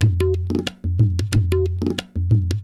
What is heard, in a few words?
Tabla; loops